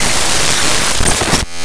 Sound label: Rhythm